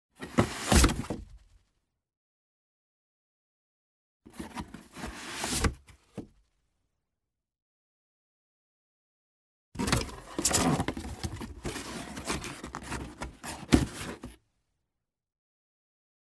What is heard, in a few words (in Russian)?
kartonnuyu korobku zakrivayut